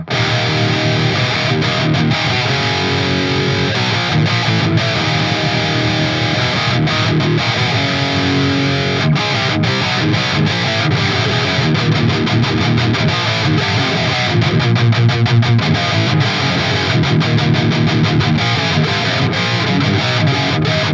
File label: guitar amp track; Guitar Re-Amping Guide; FaderWear Guides